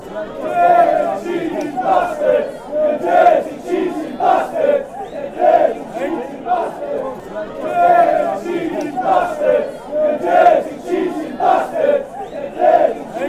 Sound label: you dirty cheating fanchants